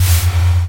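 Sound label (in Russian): кнопка цифровой шумный одиночный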